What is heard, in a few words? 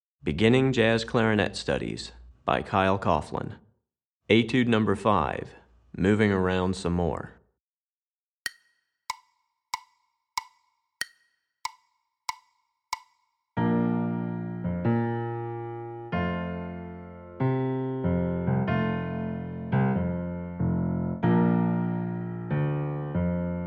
Piano